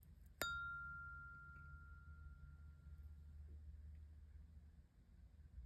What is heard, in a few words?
Throat Chakra Tuning Fork; tuning forks light blue for throat chakra